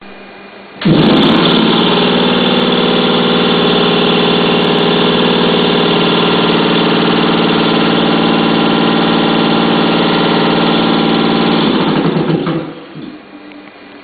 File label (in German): kompressor